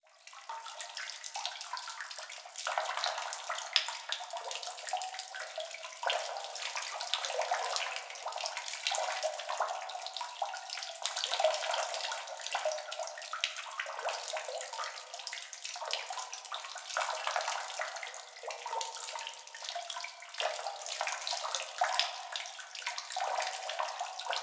Liquid Water Drips Ambience

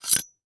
Horror Scary Knife Metal Scraping Copper

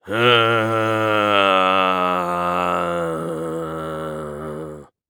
Horror Scary Undead Groan

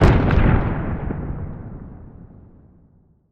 Explosion Distant Military Bomb; Fire and Explosions

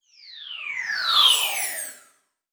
Multimedia Internet CD-Rom Flash Whoosh

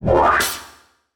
Flash Blurp; Multimedia Internet CD-Rom Flash

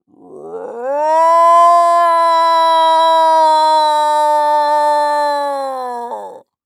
Human Dinosaur Call

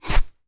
Industry Tool Shovel Dig Sand Short

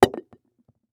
Household Plunger Drop On Cement

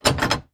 Household Door Rattle Handle

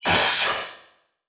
Multimedia Internet CD-Rom Flash Blast

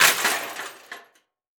Crash Metal Hitting Glass And Metal Debris; crashes; AdobeCC

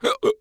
Human Male Hiccups

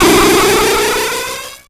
Title Transition Ascend; Production Element Title Transition

